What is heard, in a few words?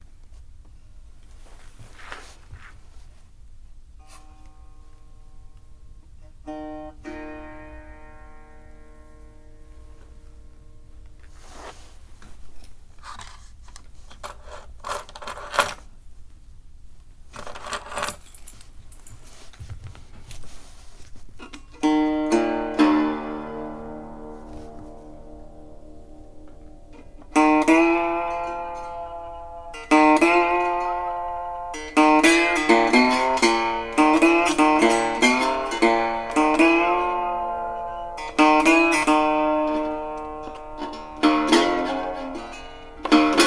guitar